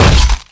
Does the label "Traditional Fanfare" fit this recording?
No